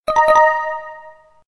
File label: correct answer